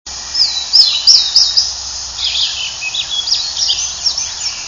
warbler magnolia with swainsons thrush; Cheesequake State Park, Monmouth County, NJ; birdsongs

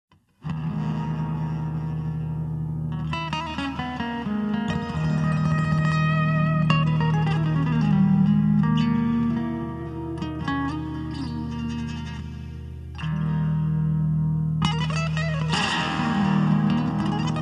Flamenco

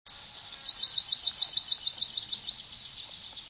sparrow swamp

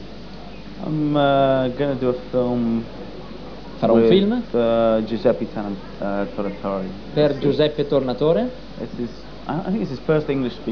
Pianist

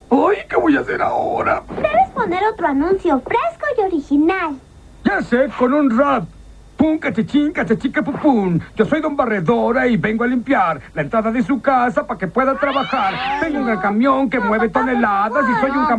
rap

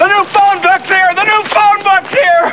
PHONE; "The new phone book's here! The new phone book's here!!"

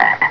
ribbit

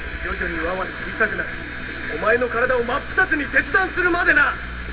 ring

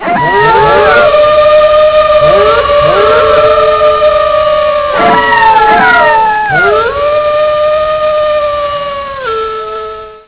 wolfpack howl

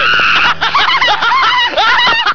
laugh mom dad

